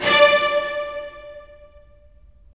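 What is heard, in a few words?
STR, strings; Roland - LA Composer vol. 4; Full Short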